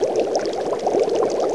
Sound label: aqua; quake2